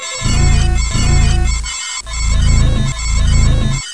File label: Scan Sound; Let's Discover Michigan!; Geo